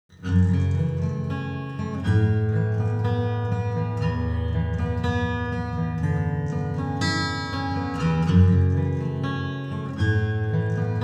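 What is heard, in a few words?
Acoustic, guitar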